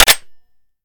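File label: weapon foley assault rifle reload fast empty dmr bolt release; weapons; gmod; viper; scharlie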